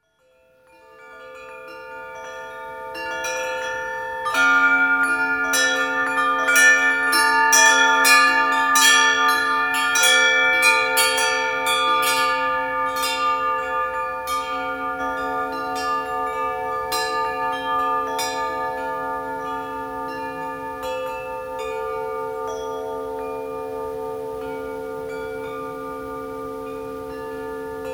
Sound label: Copper Chime Set; Thomas Gareth